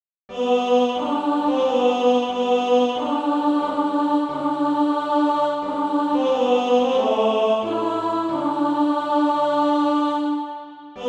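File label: tenor, SATB Practise Music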